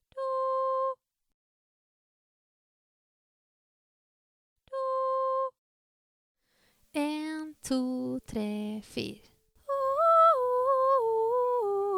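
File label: 2 soprano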